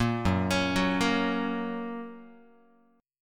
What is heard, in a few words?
Gb Major, Guitar; Gb arpeggiated; JGuitar